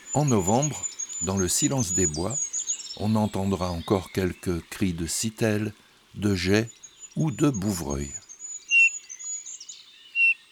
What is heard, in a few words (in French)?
Automne Le Bouvreuil pivoine; chants d'oiseaux